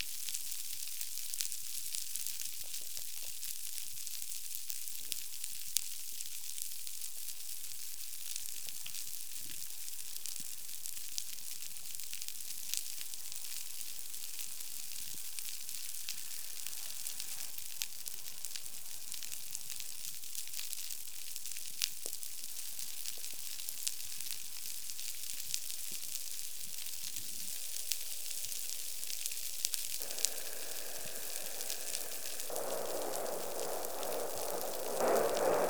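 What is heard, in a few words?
amplified soundscape, ocean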